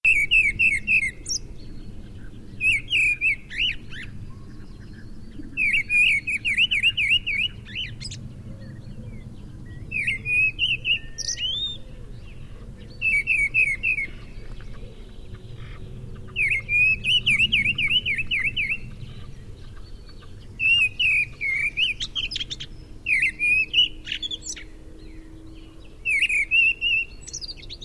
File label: mistle thrush